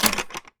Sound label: Lock